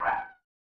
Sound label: Tile Match (Woof); Shanghai 2 Dragon's Eye; snes